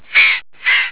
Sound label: bird